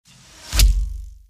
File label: arrow thud